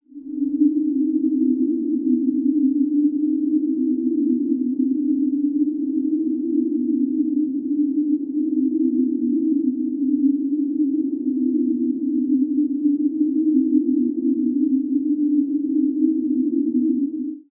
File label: ALIEN SAUCER, spaceship; spaceship fx